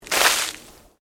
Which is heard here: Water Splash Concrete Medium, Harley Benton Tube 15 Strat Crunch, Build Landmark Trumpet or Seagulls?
Water Splash Concrete Medium